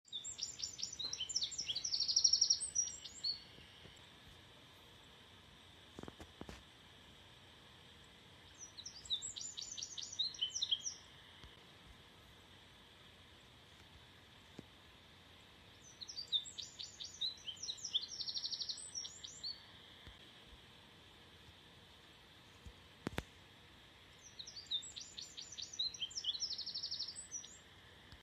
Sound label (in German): Zaunkoenig